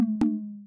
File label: notification